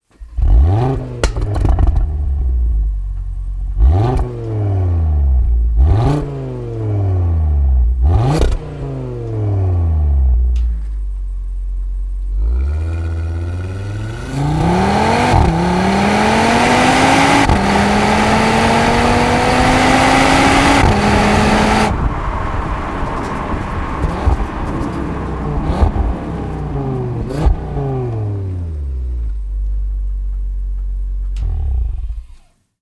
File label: STOCK EXHAUST AUDI RS 3 8Y; Sportback 8Y 2023